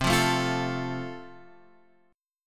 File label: C 6th Suspended 4th C, chord; 6sus4:1,4,(5),6